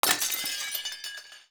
pick N Click Scene Mansion Vase

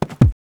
reel bounce; netent

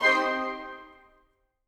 Pick And Click Rose; netent

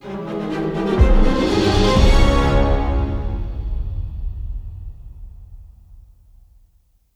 outro Free Spin; netent

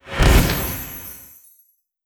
scatter add; netent

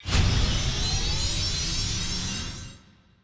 near win

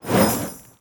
wild spread; netent

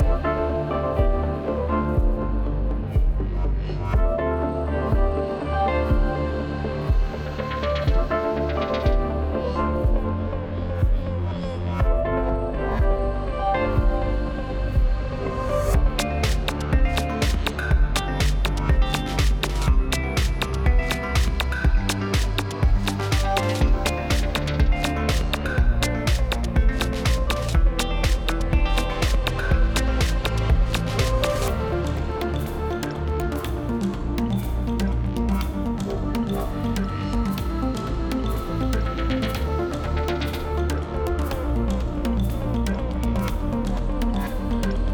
Maingame Music; fruitblaze; netent

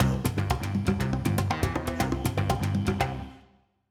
Spin jingle

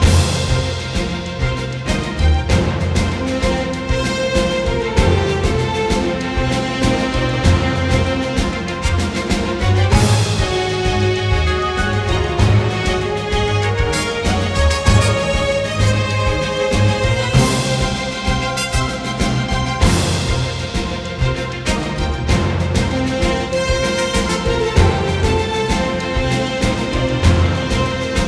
M Freespin Race; netent

